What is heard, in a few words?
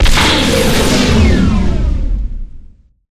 generator shield down; coop; Titanfall 2